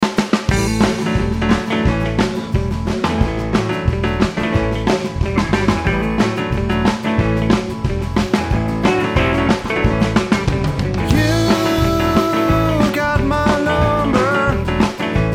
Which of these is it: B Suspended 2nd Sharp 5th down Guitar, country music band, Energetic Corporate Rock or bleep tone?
country music band